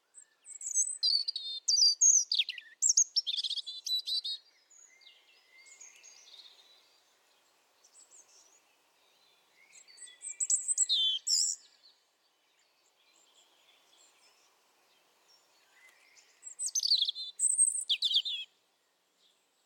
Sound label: european robin